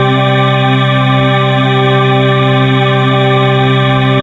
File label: heaven pad; Pads